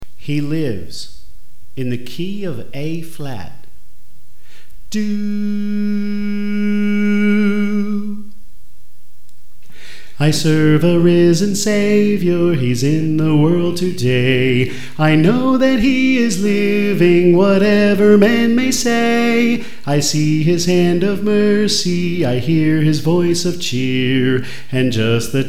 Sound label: Soprano